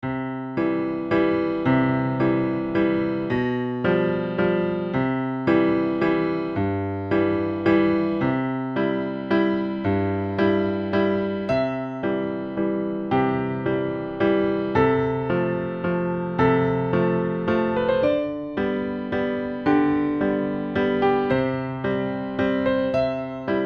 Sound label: Indoor Bar Piano